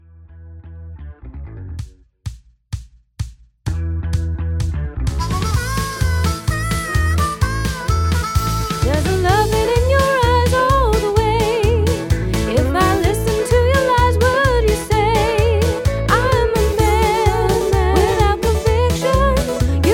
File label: Instrumental with Full Harmony; Singing Calls